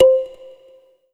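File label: Yamaha TG500 Kalimba C5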